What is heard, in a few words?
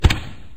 boom, fireworks